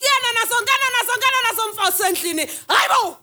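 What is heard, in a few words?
FEMALE RAVE; Spectrasonics; Heart of Africa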